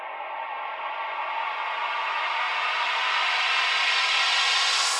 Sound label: woosh; server-nalc; warps